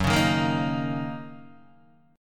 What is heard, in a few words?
Fsharp 6th down Guitar Open D